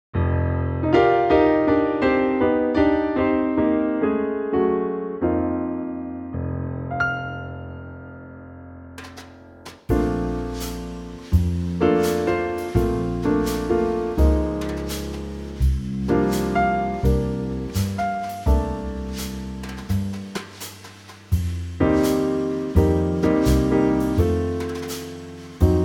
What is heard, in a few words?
Bb Trio; key - Bb - vocal range - Bb to Bb